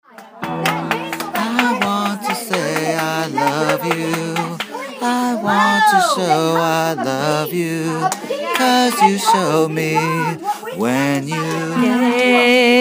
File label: alto